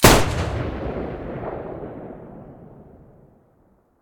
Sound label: muzzle raging mono, weapons taurus